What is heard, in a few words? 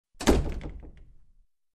Wooden Door close